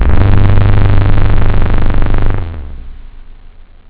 hi synth

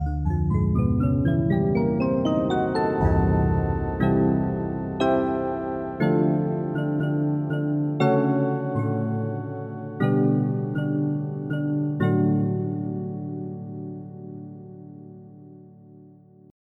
Music Box; EverQuest

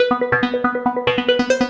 drop

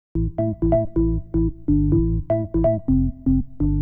organ house 125 A; eJay; Keys

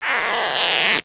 Stretch; Princess and the Pea; SoftKey

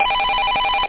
ring; Phone

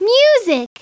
Music; Treasure Galaxy; Learning Company

